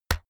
keyboard external key hit push

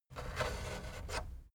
fader plastic scrape single up halfway slow

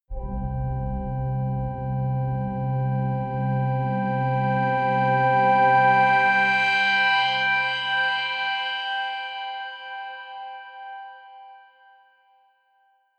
organ swell major

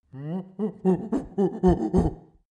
monkey chest thumping grunt

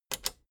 plastic button small latch click enable fast

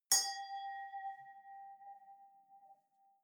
wine glass hit ring fluctuate

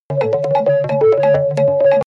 synthesized hectic bells

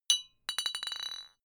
potion small drop

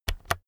keyboard external key press slow

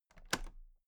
toolbox latches open regular

